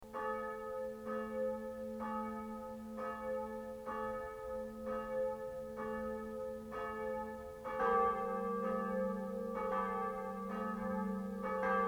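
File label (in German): glockengeläute von maria taferl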